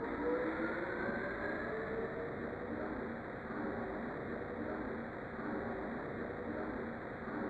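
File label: Factory loop, Yamaha DTXpress, Drum Loop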